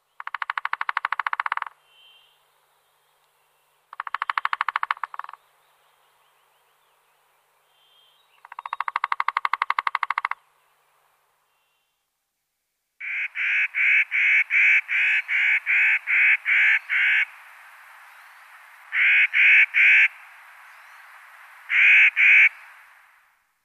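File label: trehpalyy dyatel golos, three-toed woodpecker voice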